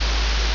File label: ambience room tone